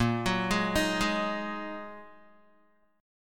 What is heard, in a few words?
Bb 7th Suspended 4th Sharp 5th, Guitar; Bb7sus4#5 arpeggiated